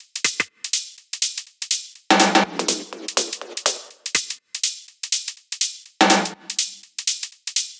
mixed no kick and snare 123

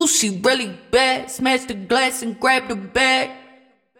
120 vocal loop wet Cmin

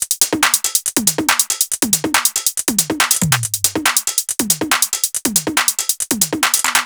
Top Loop

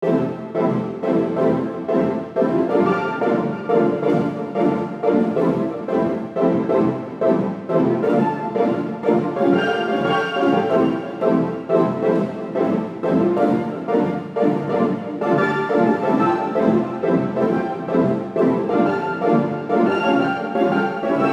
90 arp Fmaj; heaven

